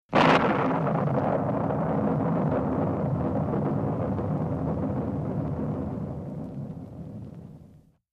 Boom Thunder Clap, Lightning, Medium Close Strike & Long Rumble Away